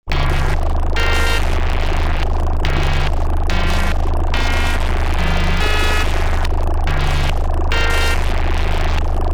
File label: SCIFI